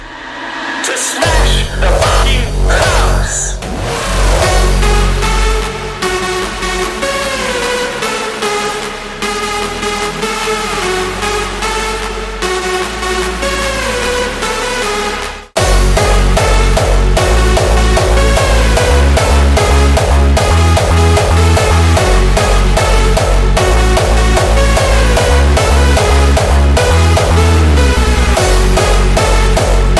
ringtone EDM

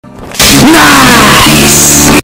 earrape

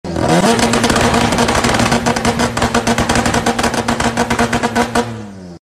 Rotary 2-step

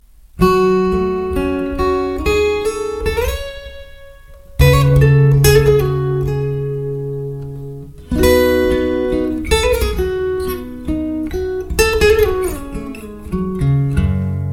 Best Acoustic Tone

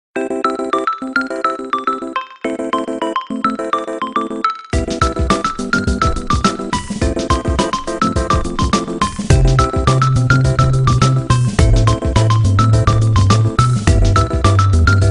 Nokia Belle

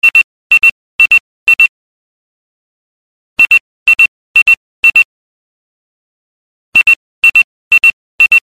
Simple Pager

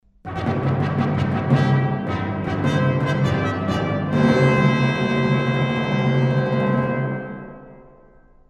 Classical

